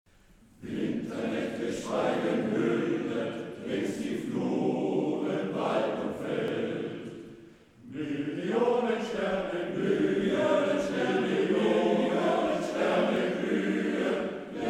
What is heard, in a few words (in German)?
Weihnachtsglocken; Musik Weihnachten